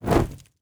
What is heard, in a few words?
Fire Burst; Survival Game Sounds - Lite Edition; Environment